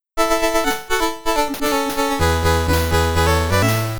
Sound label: Level Complete; Pang; Buster Bros